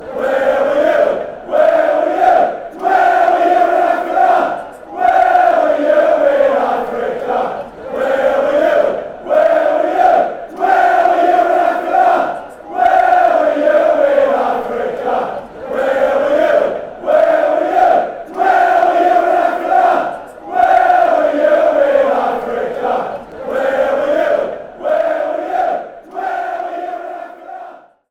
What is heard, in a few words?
in africa fanchants